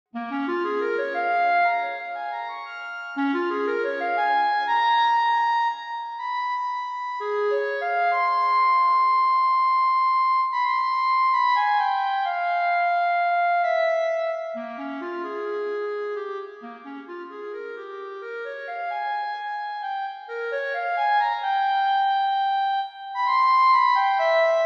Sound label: clarinet